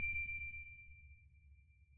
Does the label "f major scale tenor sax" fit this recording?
No